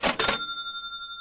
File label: notice